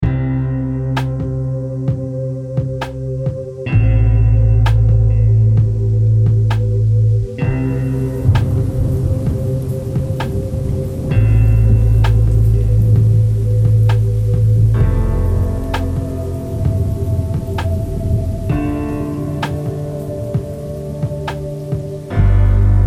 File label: rain wave; New lofi twin peaks-ish track